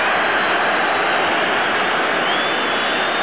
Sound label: jeer